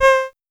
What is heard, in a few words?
Casio VL1 ADSR; Solo-Synths; Giga Samples Collection